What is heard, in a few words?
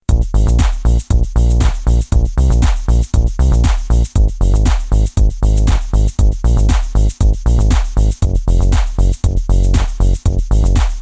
electronic; mamba; u popper